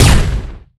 energy hit; garrysmod

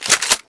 pump; garrysmod

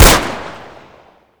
sands; garrysmod

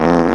sprite fart; garrysmod

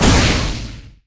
flamer start; garrysmod

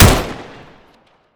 vhs; garrysmod

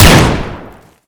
type; garrysmod

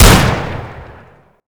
spas semi; garrysmod

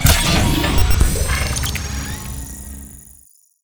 med bay place; garrysmod

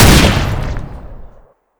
shaa; garrysmod

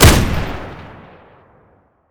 nail; garrysmod

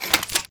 rpg7 rel; garrysmod

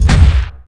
place blueprint; garrysmod

